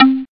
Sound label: Wood, Percussive FX; musicradar; cartoon caper